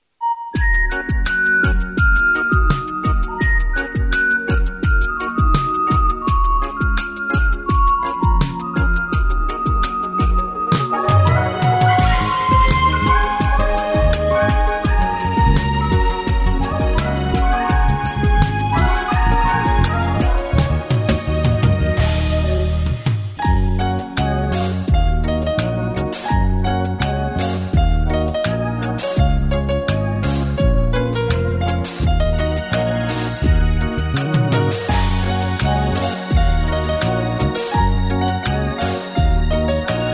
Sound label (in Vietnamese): nhạc